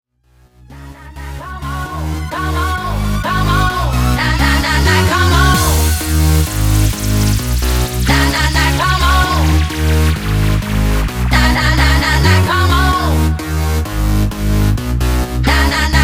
intro outro 130 bpm

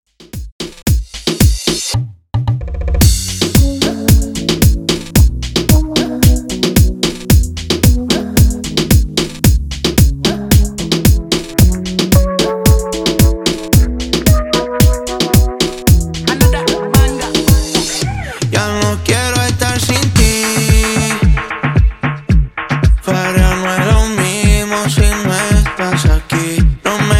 Remix Intro Outro 111 Bpm

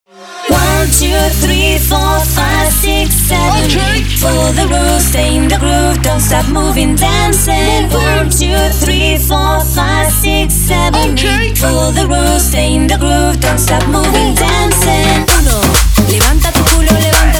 intro outro 130 bpm

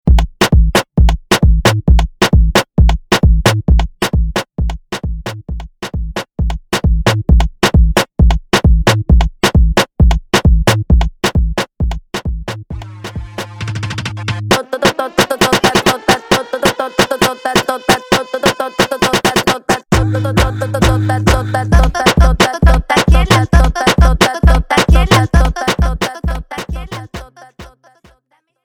Intro Break 133 BPM

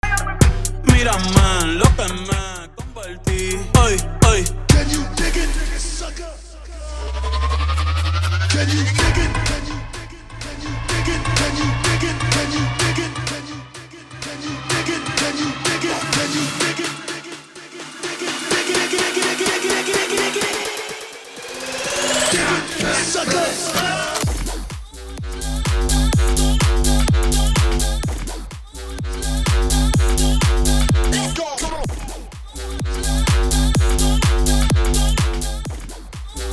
mashup 126 bpm

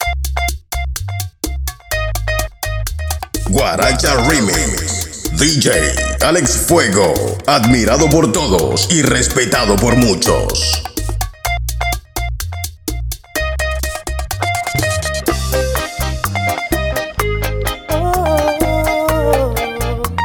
AVENTURA 127 BPM

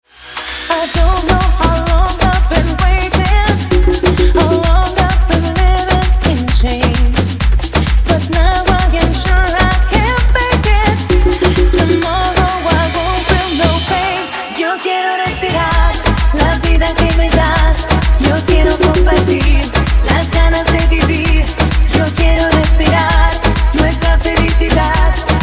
intro outro 130 bpm

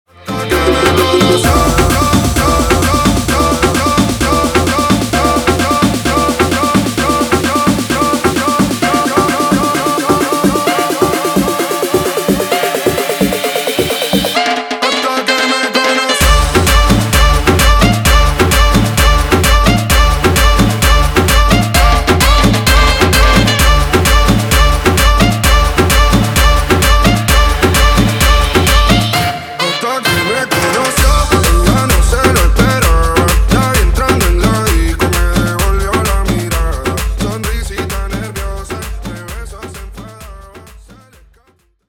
guaracha remix 130 bpm

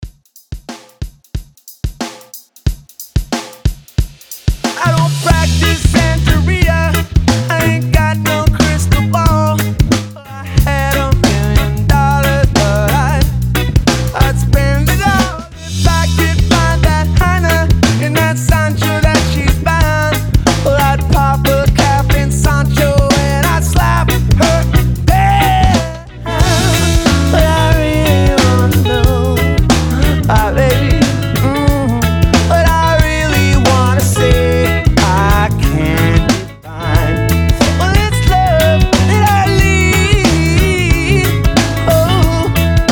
intro outro 91 bpm

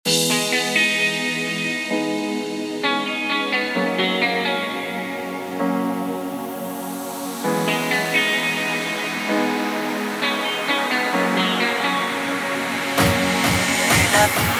intro outro 130 bpm

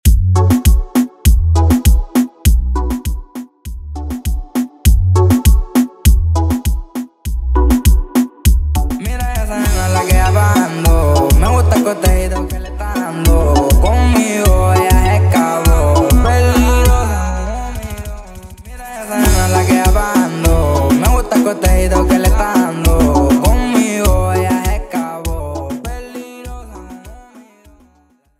Intro Outro 100BPM; 2 edits dirty clean

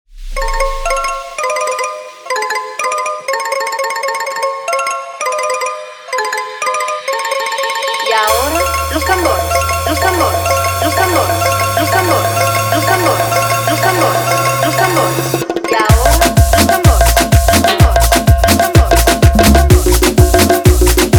la marimba afro remix 126 bpm

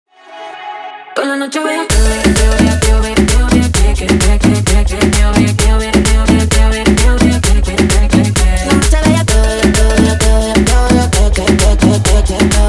guaracha remix 130 bpm

